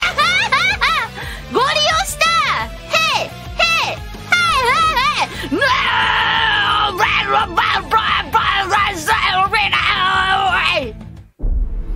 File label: polka